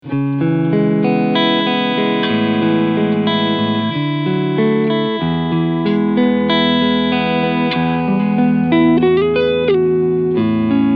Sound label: Clean Pos.